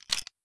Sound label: 357 bullets, weapons